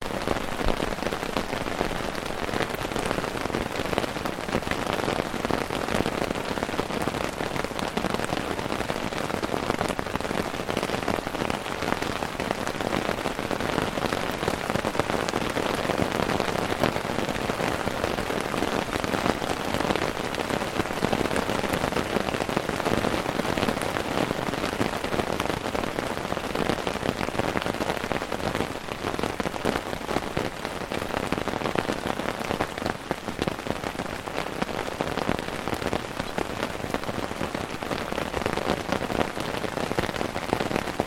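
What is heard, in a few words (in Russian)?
капли дождя стучат по палатке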